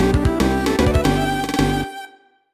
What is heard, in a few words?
Stage Clear; Donkey Kong Country 2- Diddy's Kong Quest